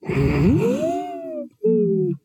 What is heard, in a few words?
inspect